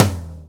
DRUMKIT T; Keith Emerson Signature Series

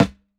SNARE CENTER; JUNGLE BEATS; ELECTRO KIT

